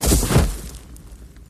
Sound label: Horse Hoof Heavy